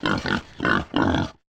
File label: pig